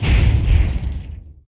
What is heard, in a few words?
boom; BESTSHOT; noisybit